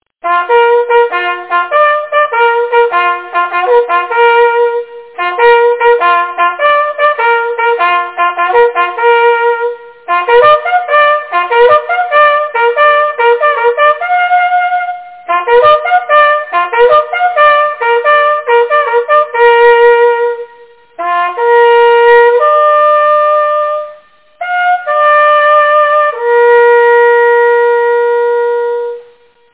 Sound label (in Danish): jagthorn; edderfuglen